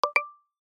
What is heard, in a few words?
Receiver Confirmation, iOS Sounds